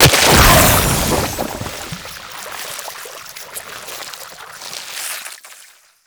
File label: Inked Enemy Death; Warframe; Tenno; Ink Rifle